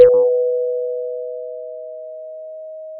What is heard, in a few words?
pop, Sound of a church bell